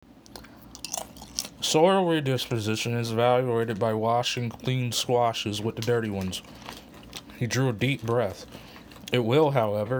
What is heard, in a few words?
M Muching Near Regular SP Mobile Primary noisy